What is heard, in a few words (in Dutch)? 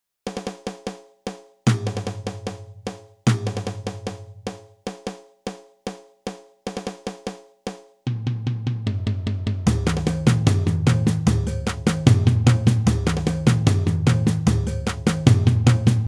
intro samba reggae